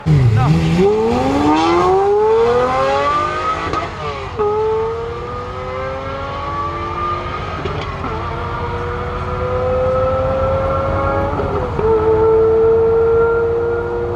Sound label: sportcar